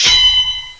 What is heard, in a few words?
use Sword On Agent